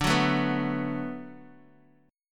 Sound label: D 6th D down Guitar Open D, chord